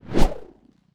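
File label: weapon char spear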